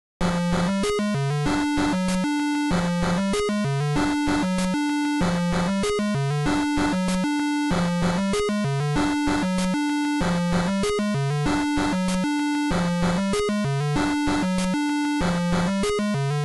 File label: music game, Game Boy Color; duality